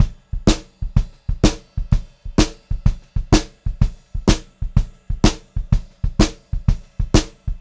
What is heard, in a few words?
VARI, Pop-Rock Drumloops; USB Soundscan vol.37; AKAI; 90 s Sample CDs